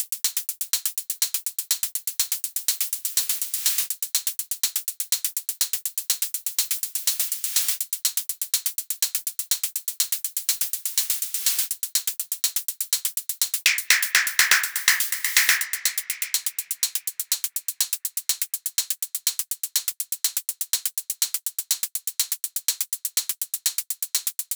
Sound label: Drum Beat 123bpm; Zenhiser